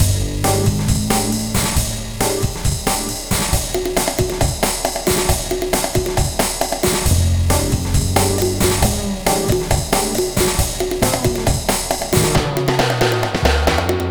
Vegas Full G, Lounge, 136; Zero-G Kocktail Kollection; Construction Kitz (Lounge); Vegas Time 136

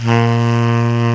SAX Tenor Breathy A#2

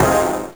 Tech Stab (7999Hz); Sonic 3; M8; Genesis

